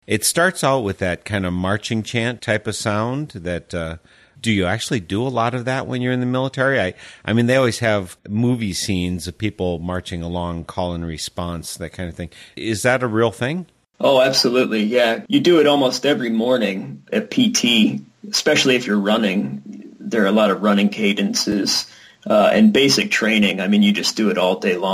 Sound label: chants